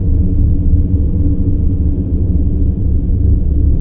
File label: inside hum; Dystopia; vaccine